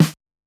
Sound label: Snare Soft, dx